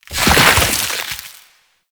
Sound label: Proc Cold Max; Warframe; Mods